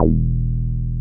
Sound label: 303 RES E2P, RES BASS